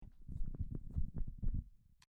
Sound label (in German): Hand Shure SM58